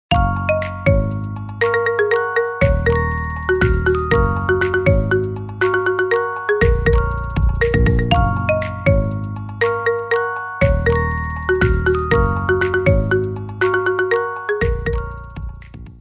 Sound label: Marimba Cover